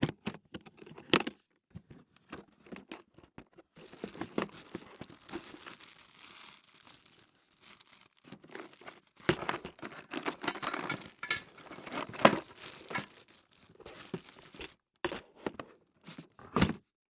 Emergency First Aid Kit Metal Opening Rummaging Through And Closing; emergency effects; AdobeCC